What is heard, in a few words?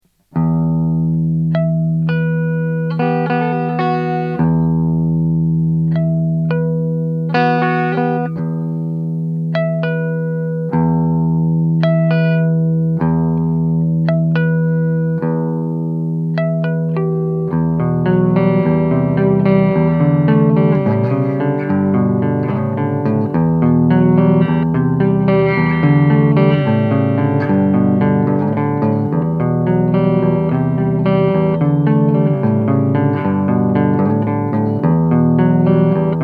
clean; lampes